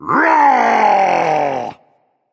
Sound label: battlecry berserk; cuchulainn